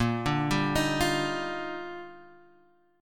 D sharp Major 9th A sharp

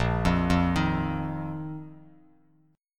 A sharp Suspended 4th Bass Standard; arpeggiated; A#sus4; JGuitar

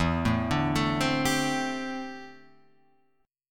D 7th Suspended 2nd Suspended 4th E

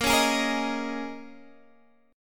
Bb Minor 11th Bb down Guitar Half Step Down

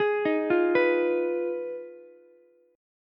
F Minor 7th Flat 5th F down Ukulele; Fm7b5 arpeggiated; JGuitar

